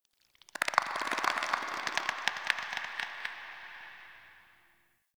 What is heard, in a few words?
Tendon Stretch Large Distant D; Warframe; Infested Eris